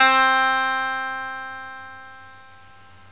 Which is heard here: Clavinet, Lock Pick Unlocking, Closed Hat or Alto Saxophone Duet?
Clavinet